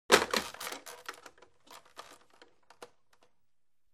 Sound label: phone up; WMBonusXP